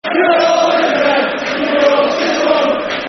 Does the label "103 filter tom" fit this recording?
No